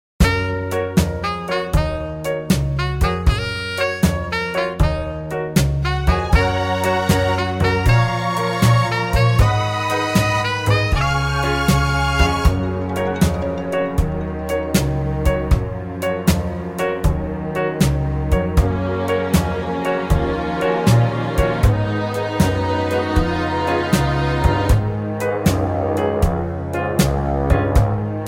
(Gb) No Backing Vocals

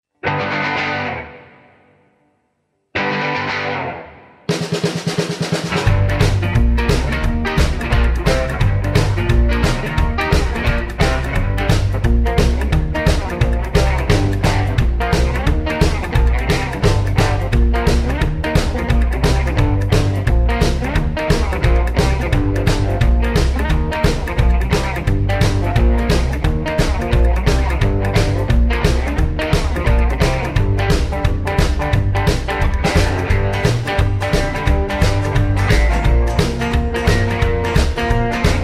Gm No Backing Vocals, backing tracks

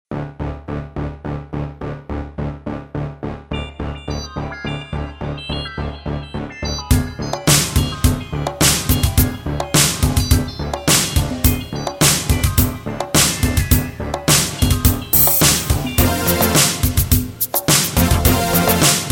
(A To B) No Backing Vocals

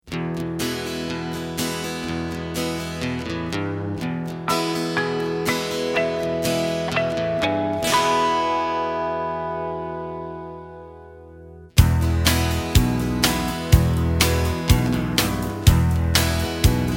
(E) No Backing Vocals

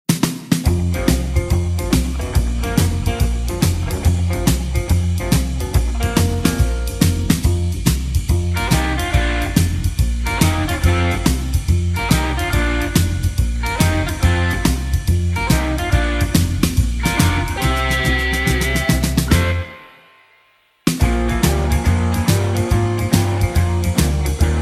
No Backing Vocals; Bb

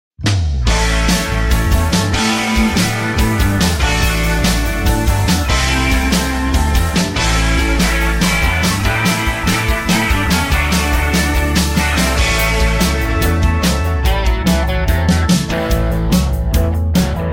(A) No Backing Vocals

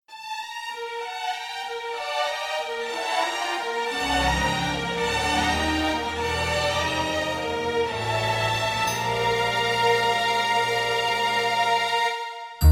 Eb No Backing Vocals, backing tracks

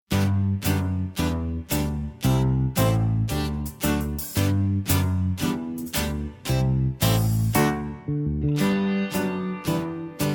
G No Backing Vocals, backing tracks

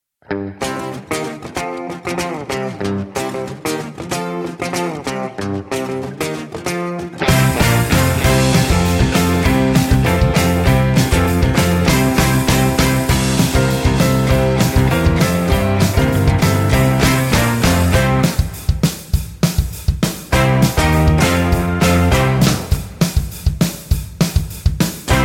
No Backing Vocals

